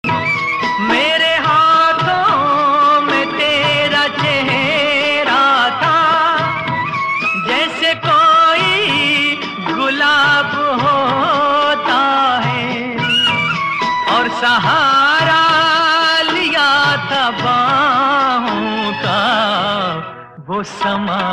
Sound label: emotional bollywood ringtone